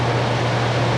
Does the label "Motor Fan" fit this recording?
Yes